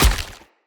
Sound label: Husk Impact Flesh, Unarmed